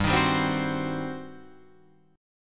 G Major 7th Suspended 4th Sharp 5th, G, down, Guitar, Open F; GM7sus4#5 strummed